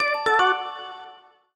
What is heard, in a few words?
win; Amatic; Fortune Girl